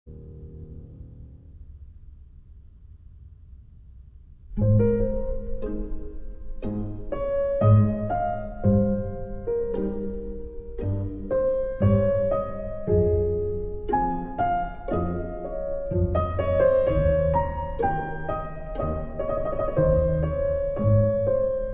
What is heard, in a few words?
Chamber Orchestra, Piano Concerto; Australian Chamber Orchestra; No. 5 in f, BWV 1056- Mvmt 2. Adagio